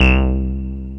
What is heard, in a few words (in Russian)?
bass gitara so svistom